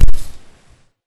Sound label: catnap footstep; zoochosis pack